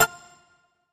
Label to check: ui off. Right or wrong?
Right